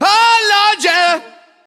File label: oh lord just SUNG; Voice; expletives